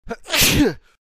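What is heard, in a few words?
Bird Sneeze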